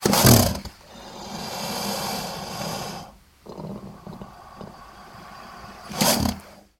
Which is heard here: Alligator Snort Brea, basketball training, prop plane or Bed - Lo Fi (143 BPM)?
Alligator Snort Brea